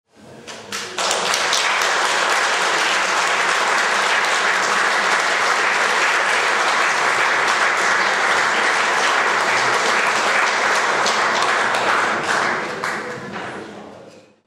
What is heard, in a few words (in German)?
Alle Beifall